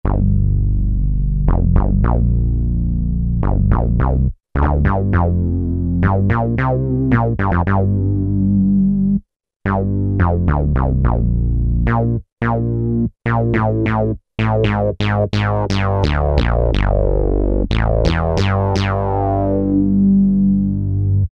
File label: MOOGY BASS; Kurzweil K2000 Best of Analog